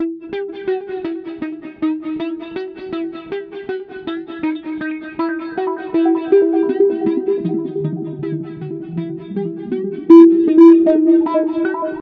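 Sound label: guitar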